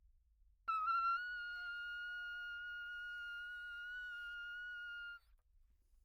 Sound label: high, pocket preachers cornet; OPENAIR